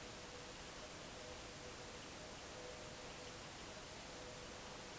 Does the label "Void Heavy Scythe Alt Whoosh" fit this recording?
No